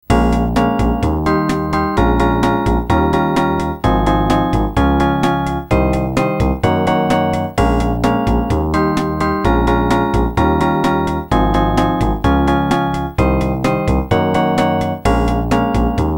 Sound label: Elevator (MSX-Music); Jurassic Park (SNES)